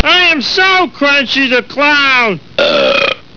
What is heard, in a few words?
crunchy